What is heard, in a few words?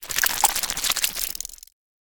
HORROR - DESIGNED - Corpse Insect; Game Audio Factory; GAFSFX01 - Dark Materials